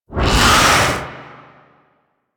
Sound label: Symbiotic Link Cast; Warframe; Warframes